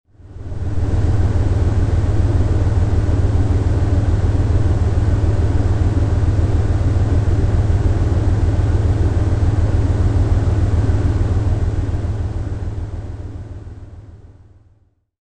applause

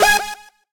synth; osu

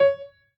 piano hi

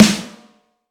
snare

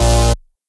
bass

